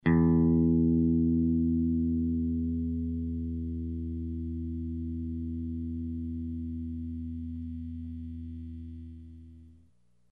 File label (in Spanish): guitarra afinar cuerda seis